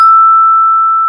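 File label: C long E5, vibraphone; AKAI S-Series CD-ROM Sound Library VOL-7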